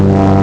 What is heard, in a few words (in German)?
kopter; Amiga Soundtracker Sample Packs